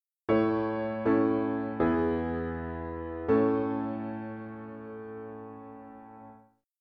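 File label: piano, dictation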